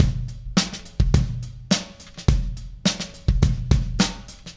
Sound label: Beat, Indie Rock; Sonic Foundry (Sony Creative Software); Indie Rock Anthems